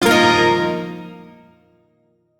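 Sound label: Shawzin Pentatonic Minor Chord; Music; Warframe; Duviri; Chords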